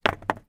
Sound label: sport pool snooker rack triangle throw down on table